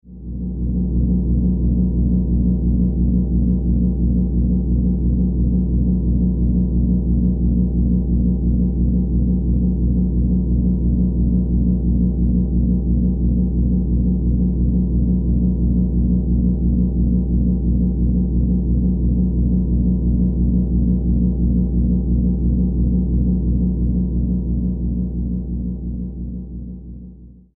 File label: Science Fiction Power Drone; Drones; Sound Design